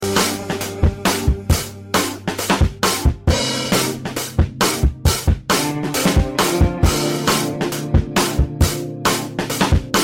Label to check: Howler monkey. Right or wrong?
Wrong